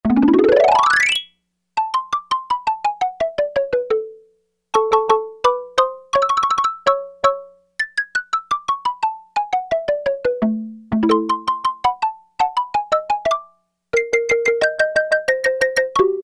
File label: Xylophone, Roland MKS-70